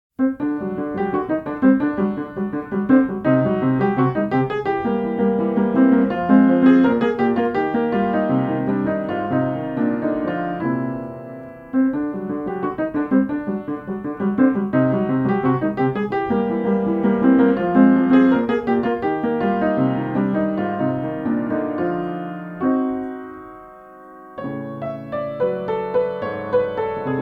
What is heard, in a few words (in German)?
Klezmer, Klavier